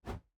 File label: mobs jump